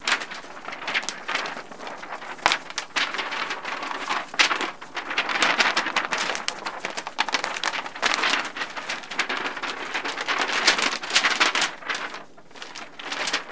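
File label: Paper Pieces Bouncing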